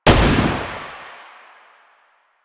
Gunbrella Shot, Weapons; Warframe; Tenno; Koumei

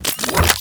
Shotgun Reload; Revenant

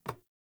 Ladder Climb Wood; Player

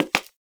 Human Loid Foley Step; Warframe

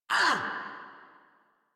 Pain, Battle; Warframe; Grineer Catbrow Handler

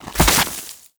Ice Proj Fire B; Warframe; Corpus; Arachnoid Camper Terra; Abilities

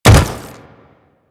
Step Default; Leto

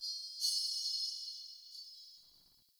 Under City Alley Distant Chains

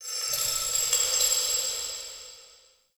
Vendor Crystal Coin; Ambience; Soul Plane

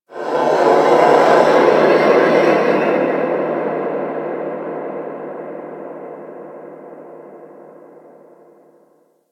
Moon Creature Large, Ambience; Warframe; Orokin

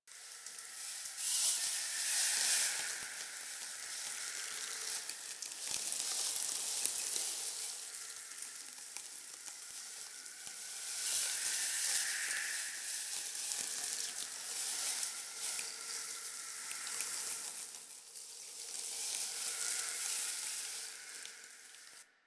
Exterior Wind Whistle Debris Spines Loop; Warframe; Infested Eris; Ambience

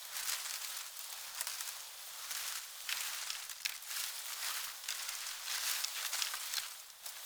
Branches Contact Movement Loop; Player; Entity Contact Movement

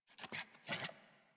Puppy Idle Growl Playful; Enemies; Vocals; Kubrow; Warframe

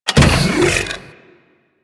Extending Bridge Start; Warframe; Ambience; Corpus Gas City; Gameplay Remaster

